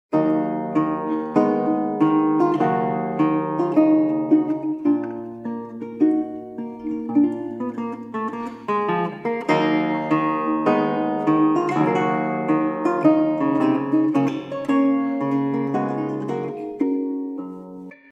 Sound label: Funeral March; 1834 Lacote; Sor